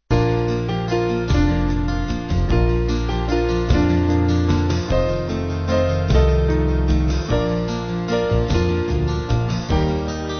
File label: Silent Night, Stille Nacht, Band